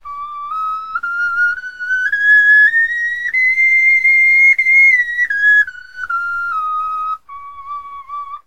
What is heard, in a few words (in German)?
tonleiter